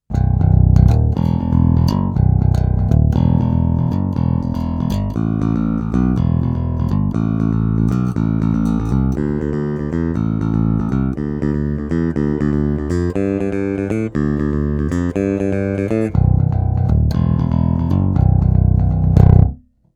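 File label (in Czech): Baskytara Schecter SLS Elite, pražcová, 5 strun, trsátko, D'Addario EPS-170 ProSteels, Stainless steel, roundwound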